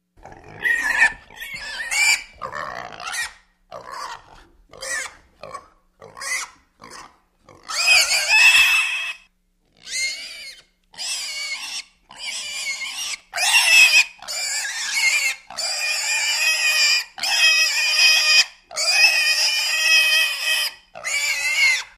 Pig Screams Close Up

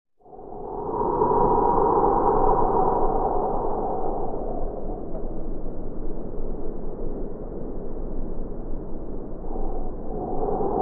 Eerie Wind Soft Cold

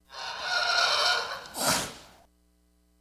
Camel Normal Call Sno; camels

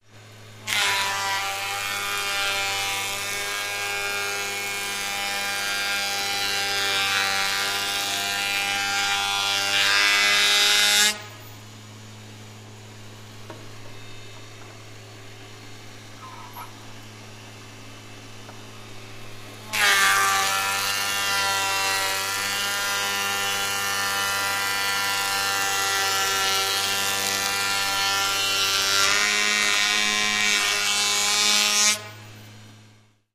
Carpentry Machine Wor; construction small

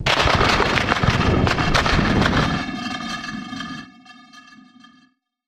Digital Grumble Data

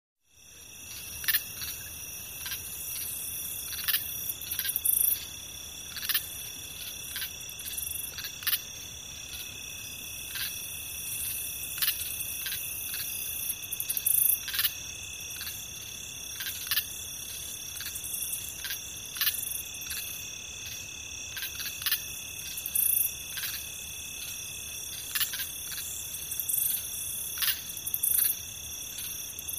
Several Crickets

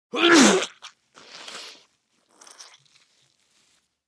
Male Sneeze Juicy Inh

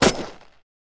M1 Automatic Weapon

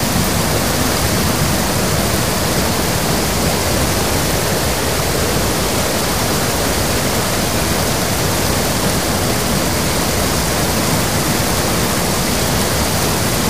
Waterfall From Aponguao, Venezuela

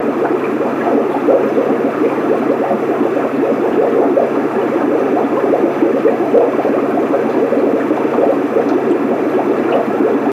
Aerator Bubbles

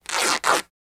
Cloth Rip Two

